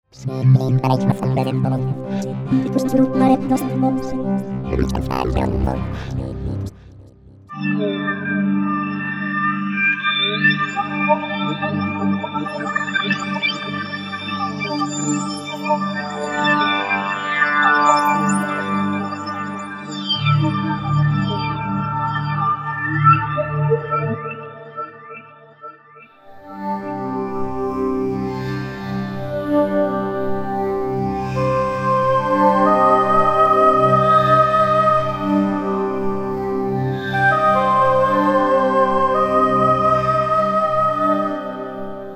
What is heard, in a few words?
pads